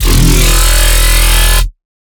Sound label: Monster, Dubstep Bass Hits; Ghosthack; Advent Calendar 2017